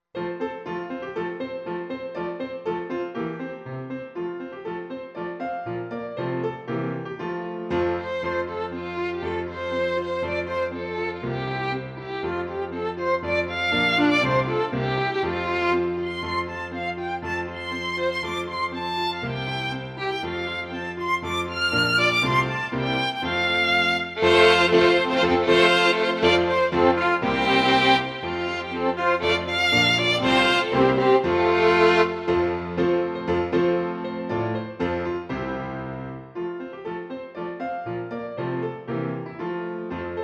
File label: Banjo; Foster; Ring ring de Banjo; 鳴れ、鳴れ、バンジョー！